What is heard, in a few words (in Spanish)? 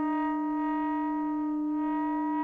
Multiarmonicos